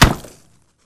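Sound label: Bullet Light Ballistic Concrete impact; Titanfall 2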